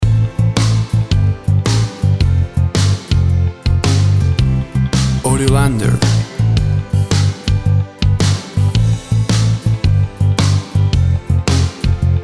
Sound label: Upbeat Party Piano Soft Rock